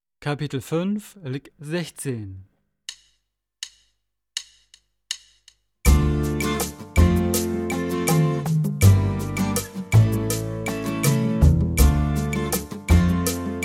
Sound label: Latin Style Guitar